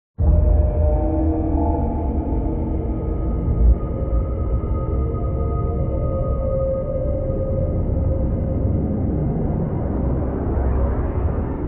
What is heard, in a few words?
Scifi; Doom in the Dark